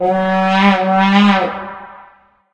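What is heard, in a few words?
vuvuzela; video games; Source Engine; tf; football manager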